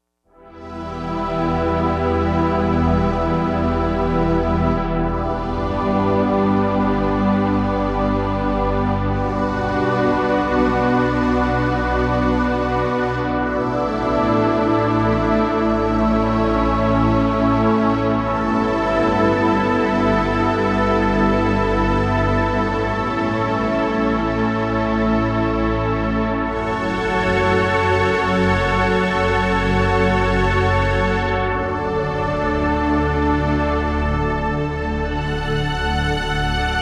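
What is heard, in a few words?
Slow Orch Choir; Digital Synthesizer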